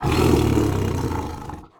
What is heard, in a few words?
growl